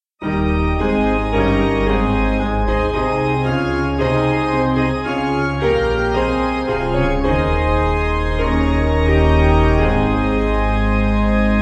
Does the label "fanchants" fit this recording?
No